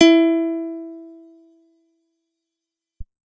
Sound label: guitar acoustic, acoustic guitar nylon